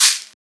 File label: Shaker; FLPRO